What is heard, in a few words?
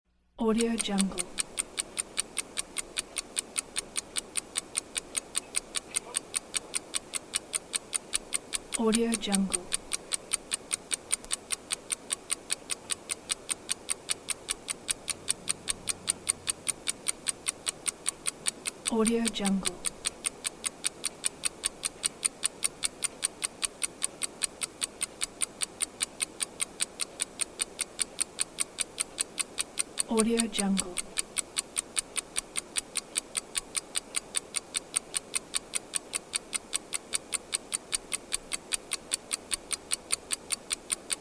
ticking clock sound